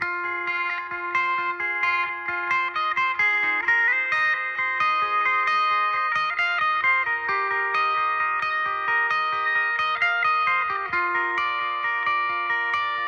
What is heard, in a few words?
white wednesday instrumental